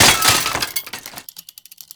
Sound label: Bicycle Hit; Warframe; Ambience; NineteenNinetyNine